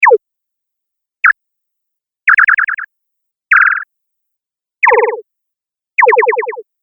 Space Future Communication Sounds Collection, sci fi